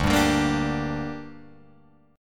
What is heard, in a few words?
D Suspended 4th Sharp 5th D down Guitar Open F, chord; strummed